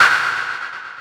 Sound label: step, sfx; anvil